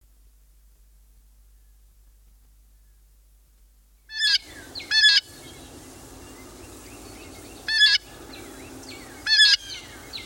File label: blue jay, songbirds